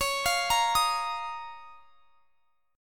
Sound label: Db 6th Add 9th Db down; Db6add9; arpeggiated